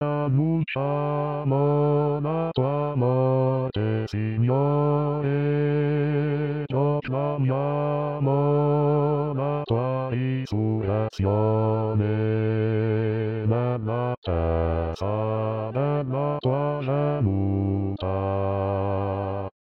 Annunciamo La Tua Morte Bassi

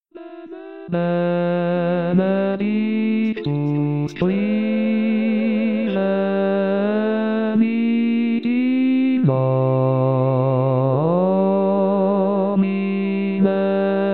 Benedictus Bassi; pasqua

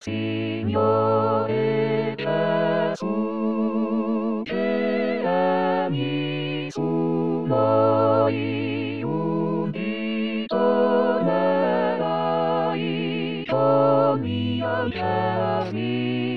Signore Gesu Coro

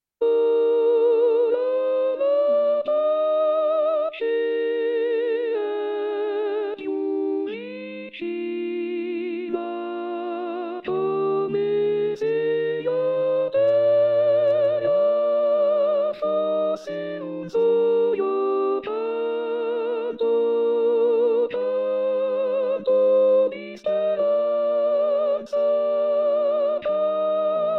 Soprani; natale

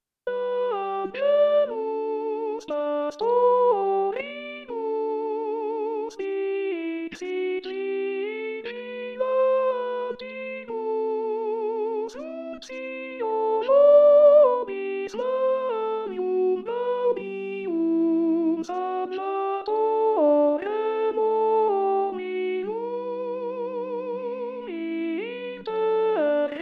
Tenori; Angelus Pastoribus; natale

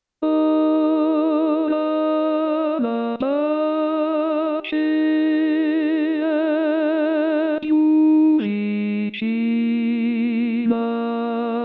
Tenori; natale

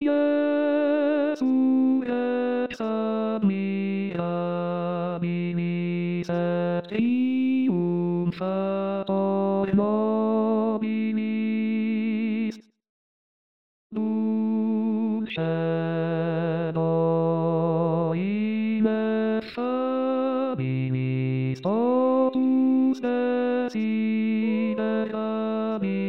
Bassi; Jesu Rex Admirabilis; Palestrina; anno liturgico

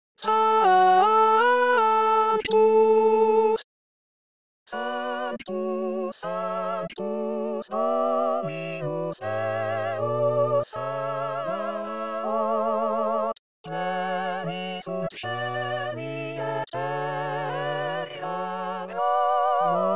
Sanctus Assemblea

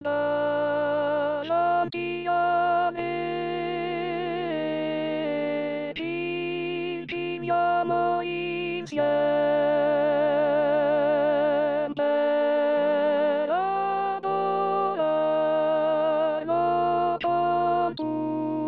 Contralti

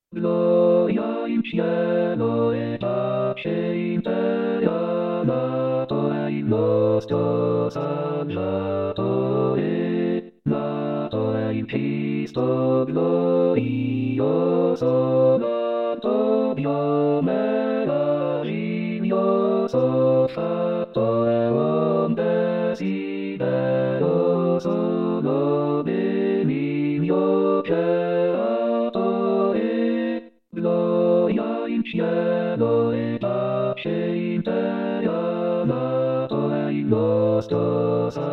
Coro; natale

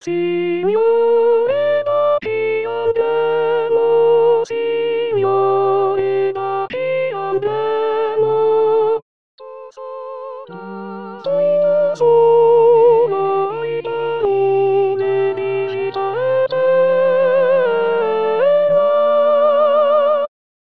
Signore Da Chi Andremo Tenori; anno liturgico

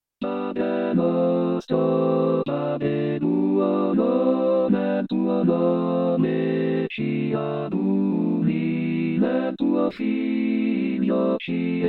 Coro

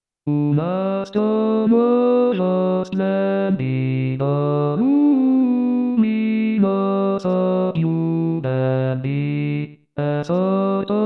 Bassi; natale

